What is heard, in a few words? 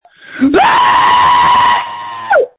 Scream